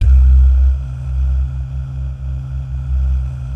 DAH, DAHS GUYS; Spectrasonics; Vocal Planet; Jazz FX

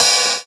Cymbal, JUNGLE BREAKS DRUM SOUNDS

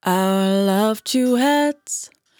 100bpm Bm, Velvet Vocal; Zero-G

